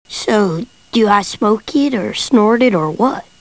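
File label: smoke snore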